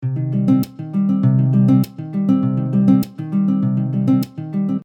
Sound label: Percussive Cmin 100bpm, Soft Nylon Guitar; MG Soft Nylon Guitar - MIDI Pack